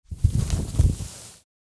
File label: Burlap sack rustic scrape